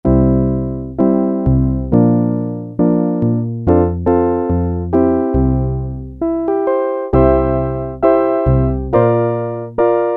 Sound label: Acoustic Piano, Korg PolySix; Factory Presets Bank A